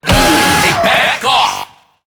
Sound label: Infested Lich Hard Drive Pain